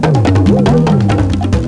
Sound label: RHYTH, LOOPS